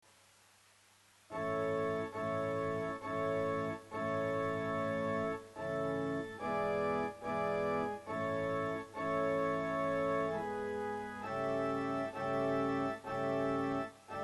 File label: ave maria organ; burgmuller